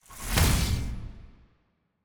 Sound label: Orbital Hammer Gravytrone Reaction; TANK; 3Souls